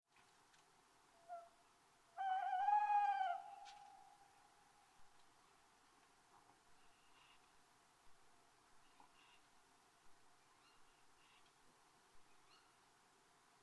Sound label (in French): Le chant de la Chouette Hulotte